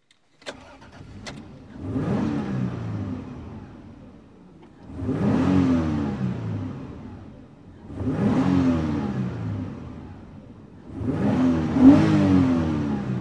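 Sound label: SUV Start Engine Rev Interior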